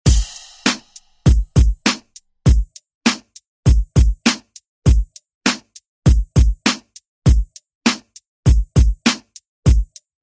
90s ReDrum; DJ Mhark

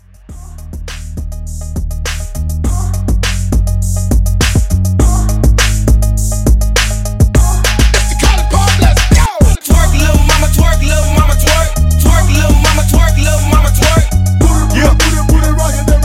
Hype Edit 102

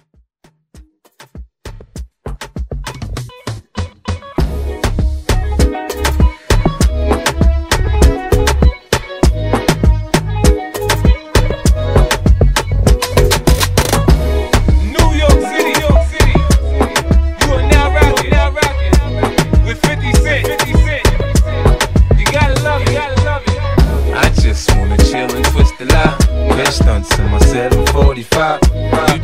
Moombah Remix; hiphop

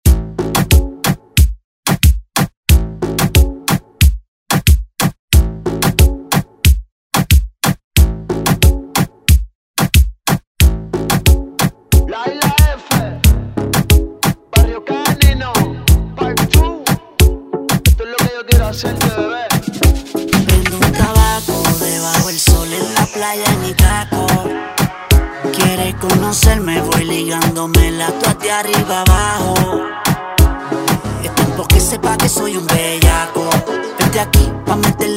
Reggaeton Redrum Intro

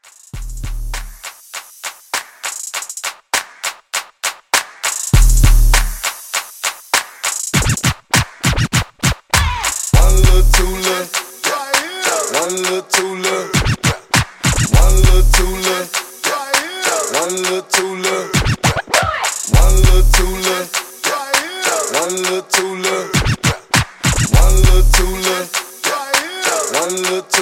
Scratch Party Starter 100

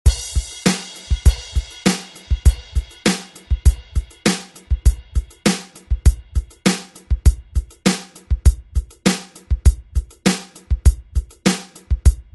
90s ReDrum; DJ Mhark

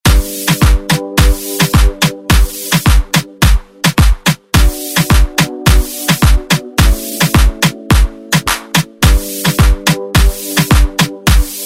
Moombahton Afro Redrum

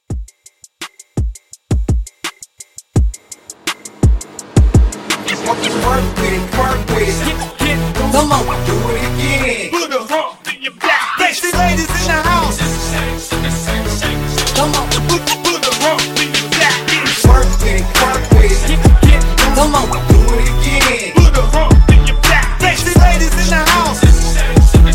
Hype Re Drum 84

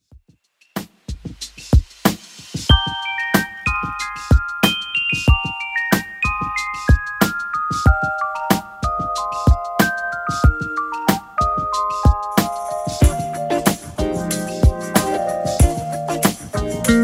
70s 93bpm; Redrum

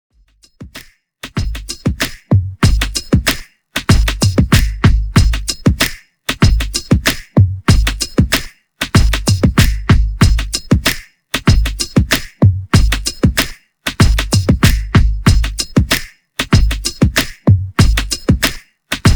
95Bpm; Redrum; remixplanet

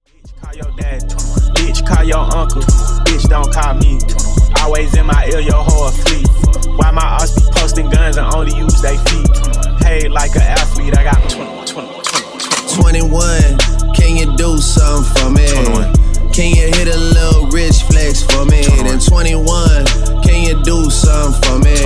Funk Dirty 80 128 Bpm

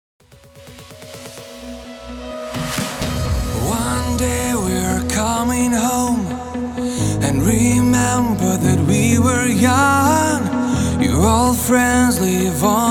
128 BPM

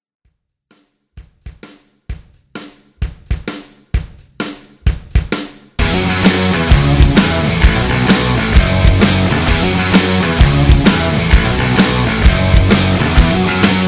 Rock Redrum

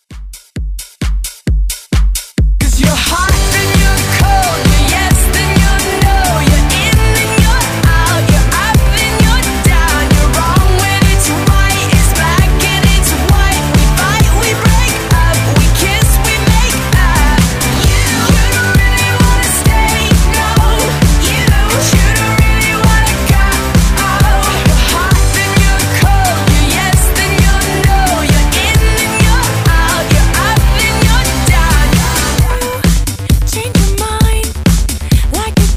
Dance Redrum Dirty

